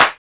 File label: DRUMU CLAP; Drums In Your FACE!; CLAPS